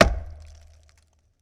Tomahawk Throw & Impact; Weapons & Firearms; Sony Pictures Sound Effects Series Volumes 1-10; Sony Creative Software

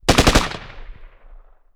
Machine Gun Bursts; Weapons & Explosions; Sony Pictures Sound Effects Series Volumes 1-10; Sony Creative Software

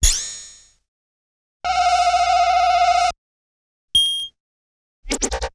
Pings & Phasers Assortment; Electronics; Sony Pictures Sound Effects Series Volumes 1-10; Sony Creative Software

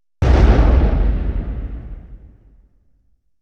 Explosion, 5.1 Surround; Sony Pictures Sound Effects Series Volumes 1-10; Sony Creative Software

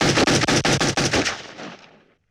Pistol Shot 9mm Semi-Auto Burst; Weapons & Firearms; Sony Pictures Sound Effects Series Volumes 1-10; Sony Creative Software

